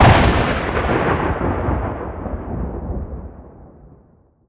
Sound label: bolt huge thunder